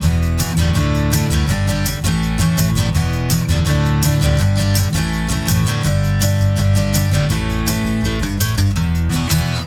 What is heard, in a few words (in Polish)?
gitara rock